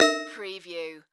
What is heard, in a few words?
Multimedia Error